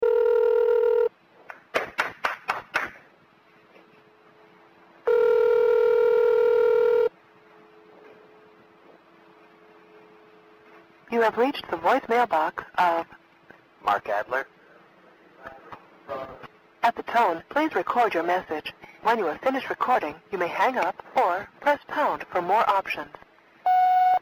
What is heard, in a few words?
Telephone Rap